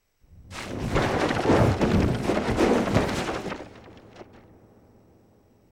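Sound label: Books Fly Through Air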